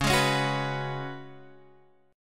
C sharp 7th Suspended 4th, C sharp, down, Guitar, Half Step Down